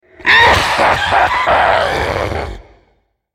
laugh; Greenterror; Character Voices - English

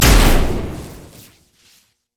stun; mark of the storm; LoL; kennen

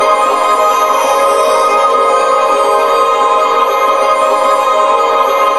crescendo loop; LoL; sona

